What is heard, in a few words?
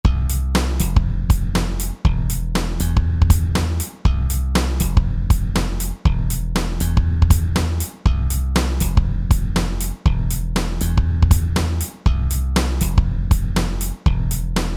TripHop